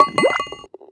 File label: Ball Sounds - Holein; pangya fantasy golf pc